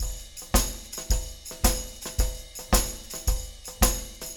ride, tam; Big Fish Audio - DOM; Dualmono

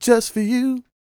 SING, Vocal Planet R&B & Dance; ILIO

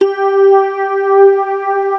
WS PLUCK, WAVESTATION; Greytsounds VOL-1

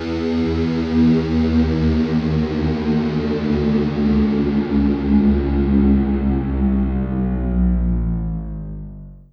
guit long way down; Sonic Foundry (Sony Creative Software); Ugly Remnants VOL-2; UR 2 FX (Long)

